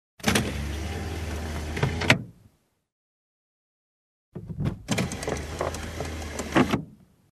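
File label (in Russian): автоматическое застегивание расстегивание ремня безопасности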